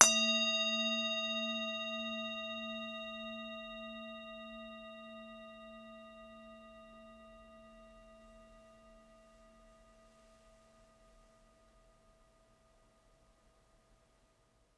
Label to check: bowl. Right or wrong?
Right